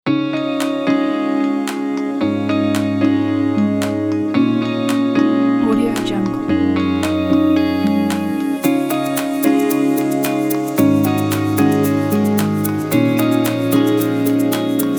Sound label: beautiful success